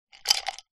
food drink ice cubes in glass liquid movement short